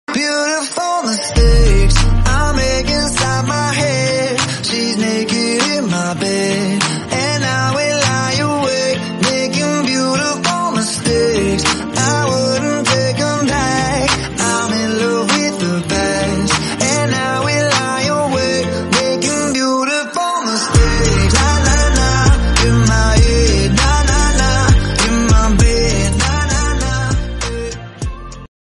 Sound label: famous song tone ringtone, english